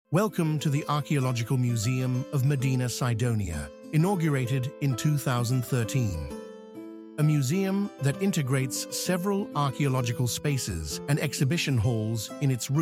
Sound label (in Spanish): música; archaeological museum audio guide